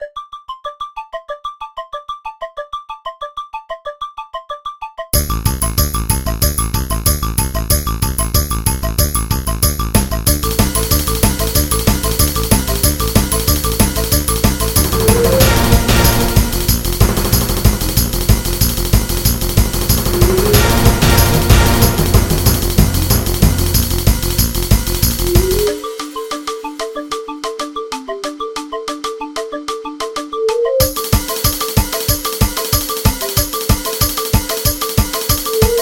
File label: Motorcycle Chase